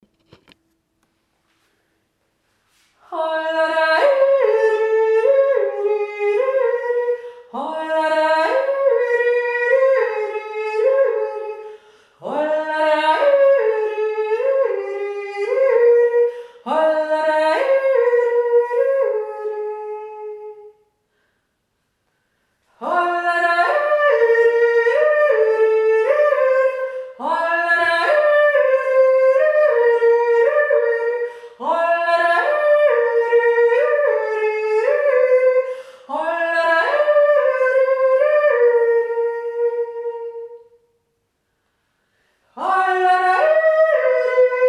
Dirndljodler 1 Stimme

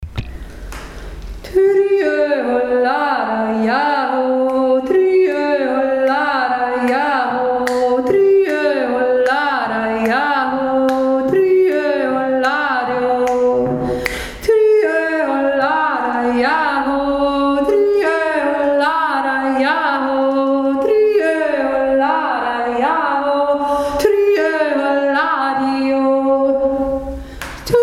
schuldirndl jodler 1 stimme schnell

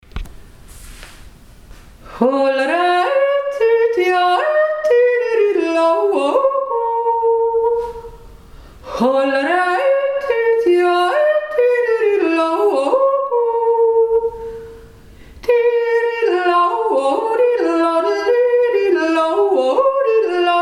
hermannsbravourjodler

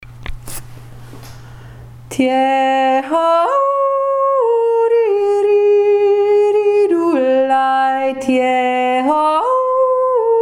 wolfsjodler hauptstimme

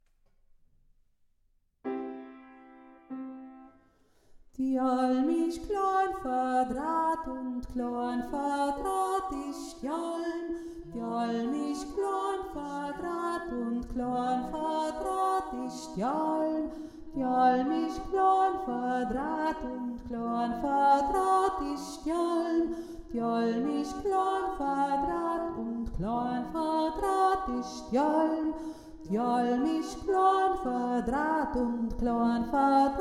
erste stimme, jodler; d alm ishc kloanverdraht

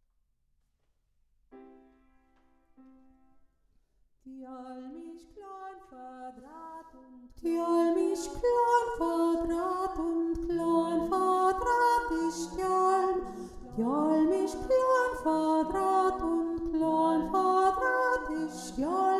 s alm isch kloanverdraht hohe stimme, jodler

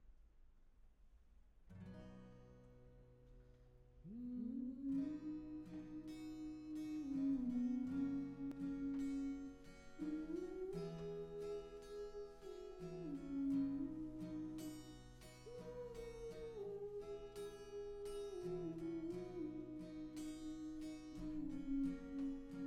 hoehe stimme

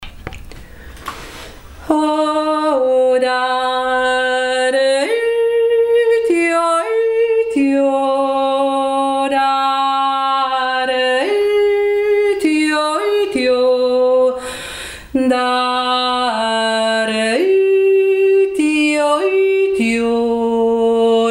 b uerinnen jodelr

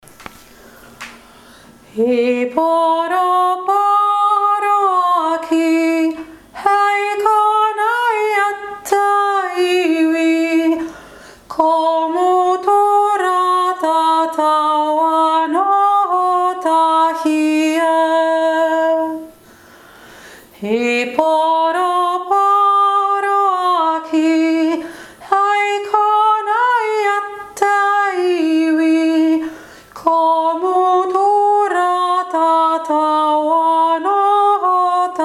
maori hauptstimme

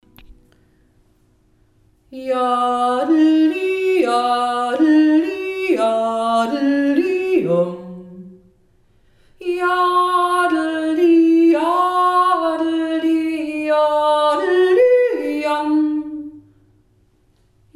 jodljodl

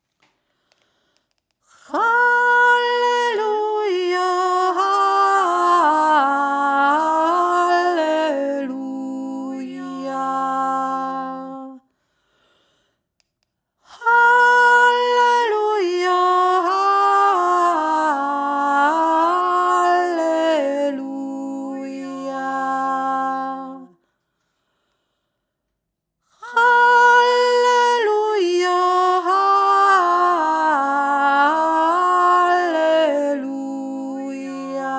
halleluja mittlere stimme

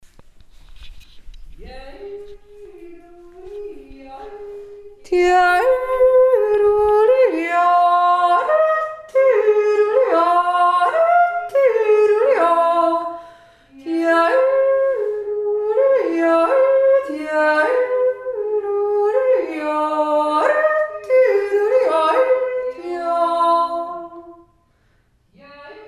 hohe stimme

